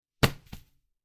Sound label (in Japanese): スマホをシーツに投げる音